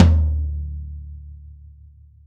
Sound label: tom, velocity tom; VELOCITY TOM 1